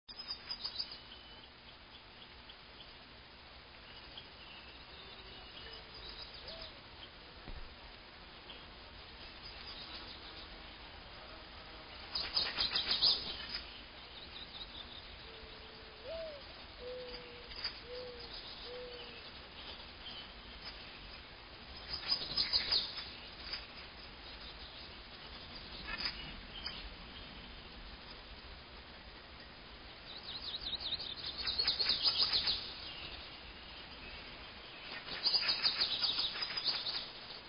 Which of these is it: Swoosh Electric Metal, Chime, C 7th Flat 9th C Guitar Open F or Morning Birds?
Morning Birds